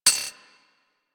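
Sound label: machine gun mech; Warframe; Archwing; Weapons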